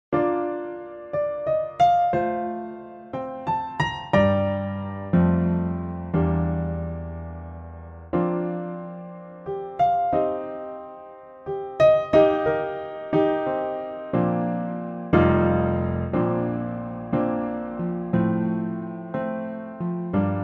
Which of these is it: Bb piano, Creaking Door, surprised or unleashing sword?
Bb piano